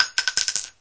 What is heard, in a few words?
small tink concrete; weapons